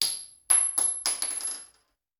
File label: Bullet Shell; Sound Ideas; Guns